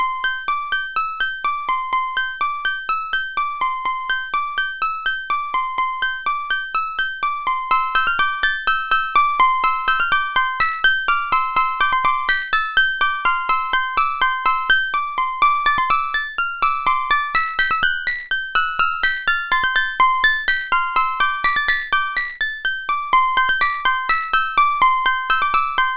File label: gentle forest rain midi; MED